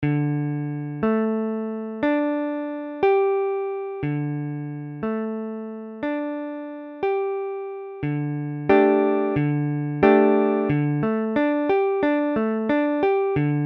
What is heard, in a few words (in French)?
Dsus4, guitare